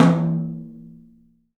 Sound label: tom, studio toms; 3D Percussives